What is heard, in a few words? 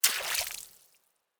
LIQUID HIT Lake, Branch, Splash, Lake, Seaweed in Water, Small, CU; Articulated Sounds; Magic Elements vol.2